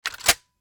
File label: m79 close; tacz; daffas arsenal